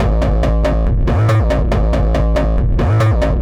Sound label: 140 A BASSLINE, Dubstep